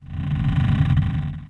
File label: Menu Popup; Pirates